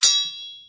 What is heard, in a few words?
hit; hexagon glitch 3